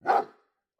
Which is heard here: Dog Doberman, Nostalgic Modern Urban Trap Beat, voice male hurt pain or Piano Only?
Dog Doberman